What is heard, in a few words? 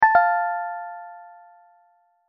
correct